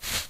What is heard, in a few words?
clothes collide